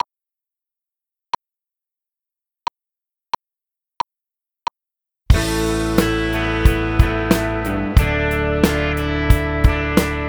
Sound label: GTR Drums